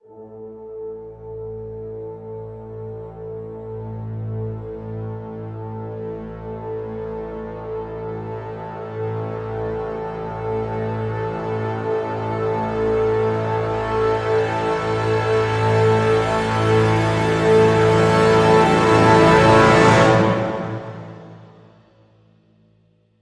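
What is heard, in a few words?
Crescendo Brass Swell Full (A)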